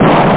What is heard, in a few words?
damage; nettrek